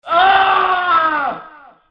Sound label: scream